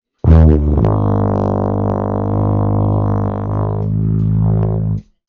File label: high low sustain, tuba